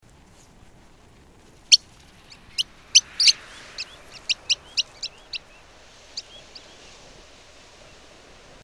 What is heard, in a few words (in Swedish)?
Sandlopare